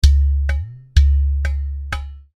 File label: drum strokes